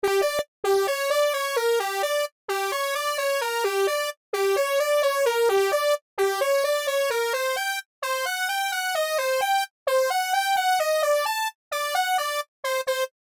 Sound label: Synth